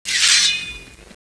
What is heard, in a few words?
katana deploy; cstrike